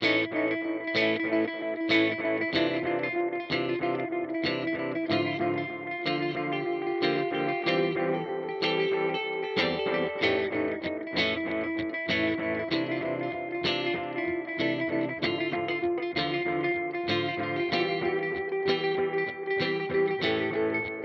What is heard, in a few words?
Memory Boy Gain Delay